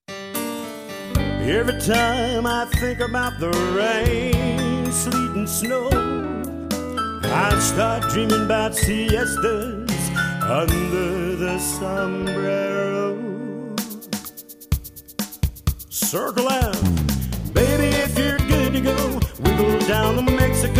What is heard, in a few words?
vocal